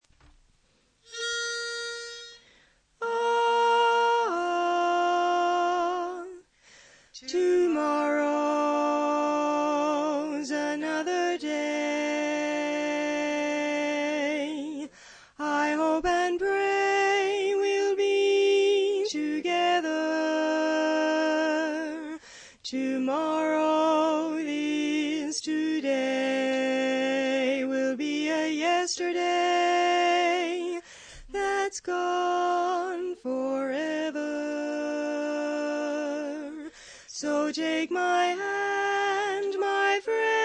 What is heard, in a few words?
Lead predominant